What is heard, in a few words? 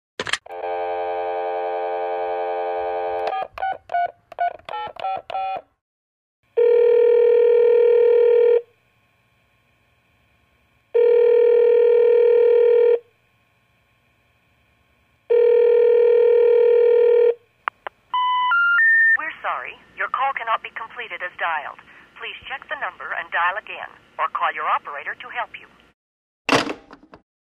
Pick up the phone, dial the number; telephone